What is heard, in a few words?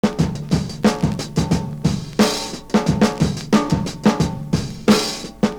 OLD SNARE; Univers Sons - Kitch 70s